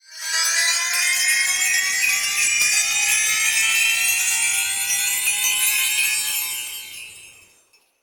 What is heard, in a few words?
near Win; secrets of christmas